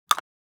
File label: bone break; fights bodyfalls; Asian Show Sound Effects